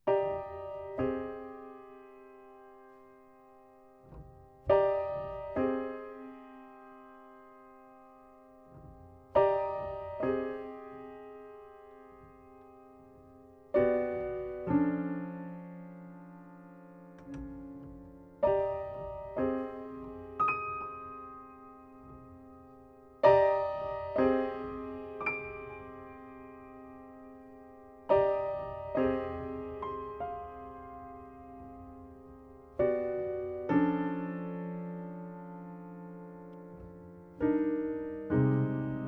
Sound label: Piano, Alto Sax, Voice